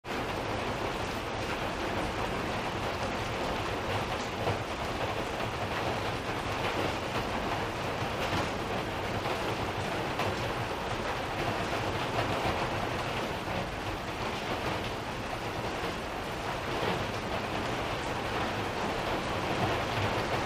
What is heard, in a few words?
Rain On Skylight Ste, rain